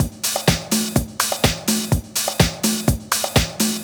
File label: top loop tech fills; tech house